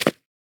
Short foley

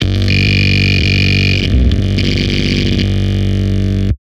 Distort

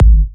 Kicks

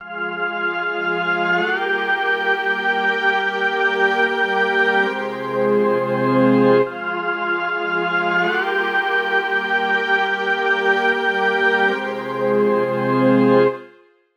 Melody Loop - 140 BPM F Min, Hip Hop; Humble Currency Melody Loop; Cymatics - Humble Hip Hop Sample Pack

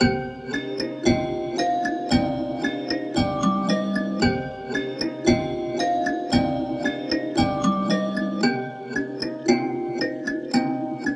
114 BPM C Min, Dark; Cymatics; Monarch Melodies; Fracture

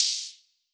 Open Hihat; Leaves; Cymatics; Lofi Starter Pack

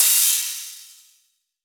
Crash, cymbals; Cymatics; Future Bass Starter Pack; Drums - One Shots

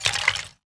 Crafting UI Tab Button; User Interface

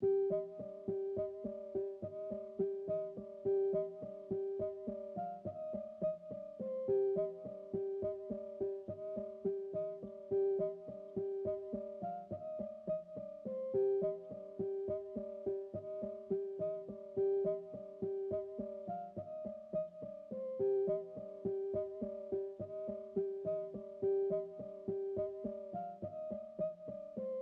140 BPM C Min Guitar; Trap; Melody Loops; Loop Stems; Cymatics

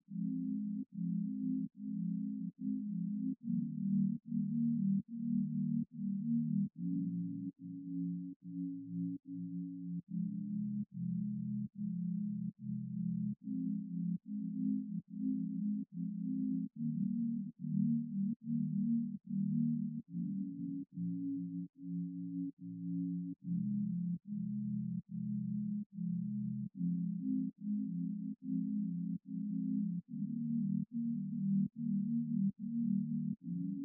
144 BPM F Min Pad, Hip Hop; Melody Loops; Cymatics; Gracias

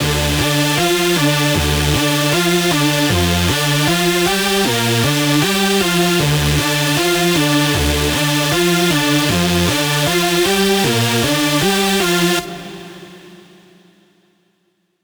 lead loop Dmin 155; Music Loops; GhostHack

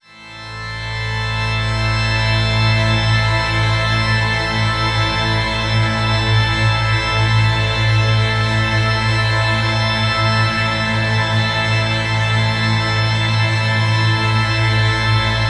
Organ Swell Am 70Bpm; Construction Kits; STEMS; Ghosthack; SkyRealm; Kit 20 - Sea Of Souls

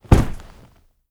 Onto Couch; Fairlight Sound Lib

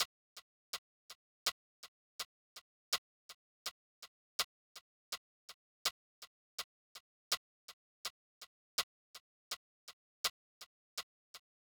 Drum Loop, 82 BPM Percussion; Cymatics; Vibes II Drum Loop Collection; Distance Drum Loop

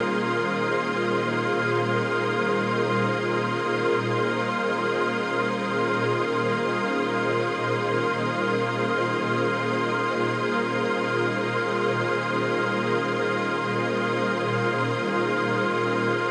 Tonal Ambience (Modal) - D# Min; FX

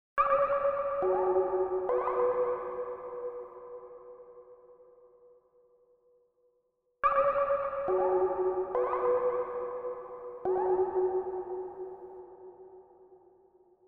140 BPM F# Min Pluck; Trap Melody Loops; Loop Stems; Cymatics

